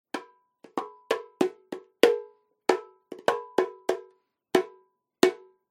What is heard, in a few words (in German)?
Holztrommel mi